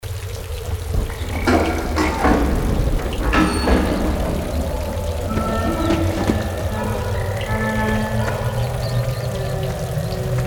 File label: halloween haunted house dungeon